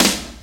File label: eighties airy sizzle snare b key; snare drum samples